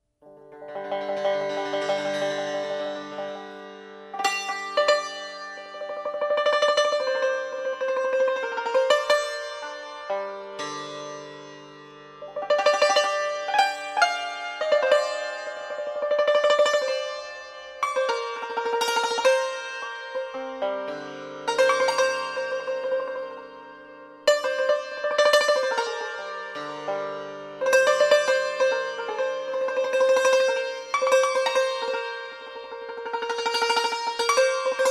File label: Dashti Improvisation